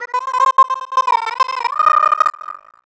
VOICE FX, Dance Vocals

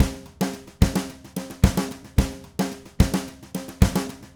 Red Maple Groove 110, DW Red Maple Kit

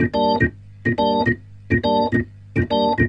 Roots Reggae, B-3; Sounds Good - Roots Reggae

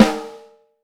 HHB, Vintage Snare Drum; Q Up Arts; Johnny.C's Vintage Snare Drum; 5.5X14 SLR

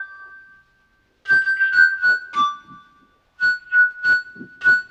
Flute; Sonic Foundry (Sony Creative Software) - Stylus Pressure Urban Grooves on Digital Wax

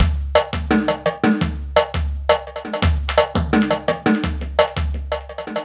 Mixed Percussion, percussion loops

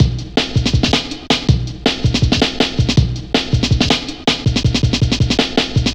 Drum Loops, Jungle

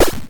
Electron Sidstation, Drum Machines

